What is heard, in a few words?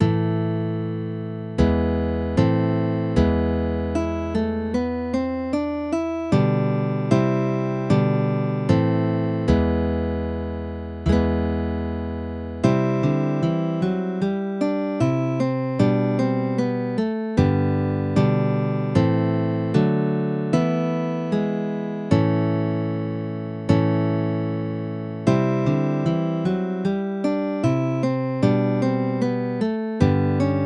pavana in a minor, guitar; milan